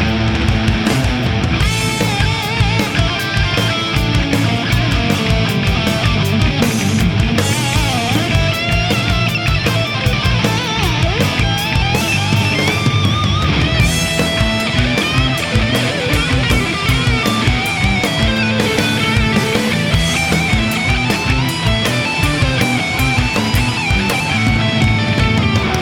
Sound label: solo section